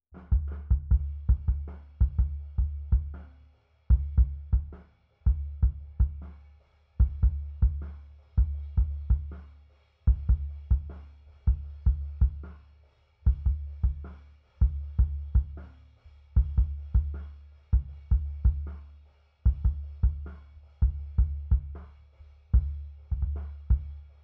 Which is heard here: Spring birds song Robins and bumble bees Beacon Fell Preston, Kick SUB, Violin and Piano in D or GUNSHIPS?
Kick SUB